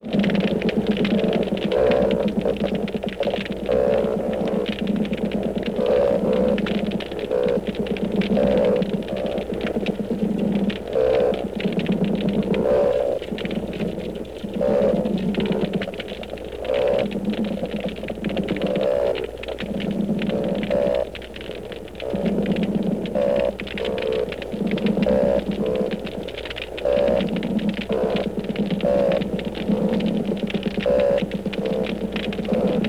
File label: shellfish croak underwater shrimp cackle; Kaip kalba krevetė; juru gamta